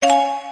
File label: message